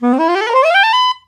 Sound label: FST SCALE UP, SOPRNO SX